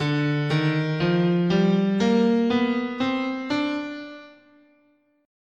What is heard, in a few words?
D Symmetrical, scale